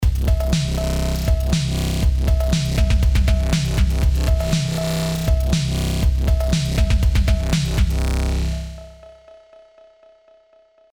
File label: CMI V Beat Dist COLDFIRE Drums Sidechain